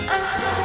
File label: moan